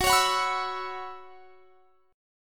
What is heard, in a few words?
Gb 7th Suspended 4th Gb down, chord; Gb7sus4 strummed